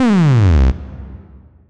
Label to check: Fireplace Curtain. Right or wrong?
Wrong